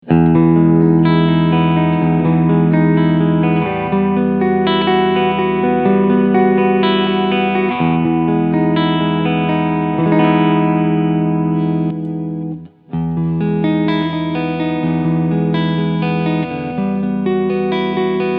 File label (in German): Fender JV Modified 50s Tele Cleansound alle 4 Schalterpositionen